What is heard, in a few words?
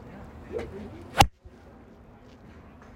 5 wood